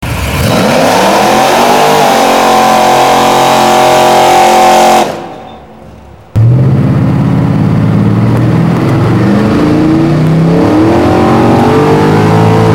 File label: corvette stingray